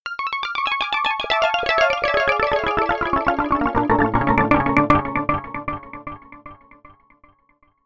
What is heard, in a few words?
arpeggios arpeggio